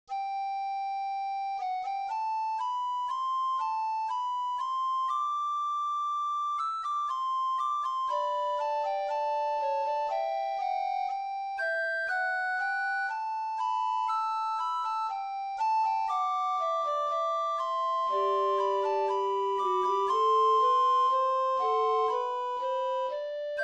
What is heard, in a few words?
Puzzle Canon